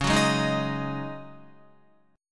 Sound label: C sharp Suspended 2nd Suspended 4th, Guitar; strummed; JGuitar